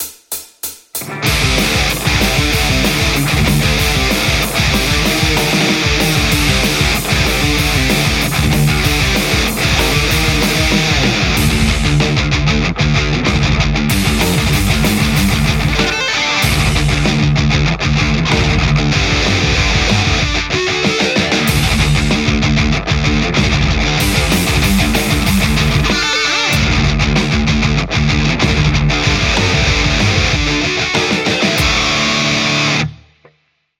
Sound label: metal tele; tse x50